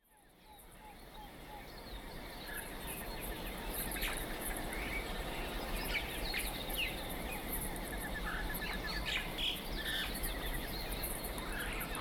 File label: jungle, ambience; ambient; minecraft; Remastered